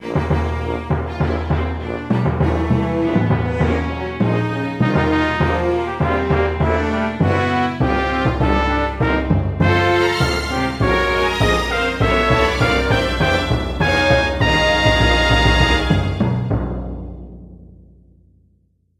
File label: fanfare; Open source MIDI music by Fredrik Johansson